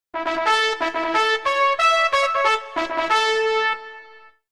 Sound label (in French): Trompette